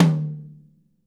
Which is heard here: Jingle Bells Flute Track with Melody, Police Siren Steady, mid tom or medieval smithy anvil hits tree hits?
mid tom